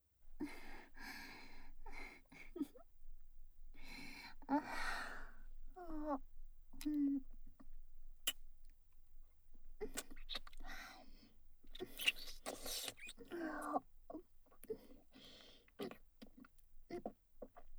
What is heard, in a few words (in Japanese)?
ぐっぽりフェラループトラック, 効果音なし, ASMR; お姉さん; 一人で両耳責めしてくるプロ級耳"奥"舐めマスターなお姉さんにお耳の奥の奥までほじほじグポグポされる話; "濡れ"耳かき×耳"奥"舐め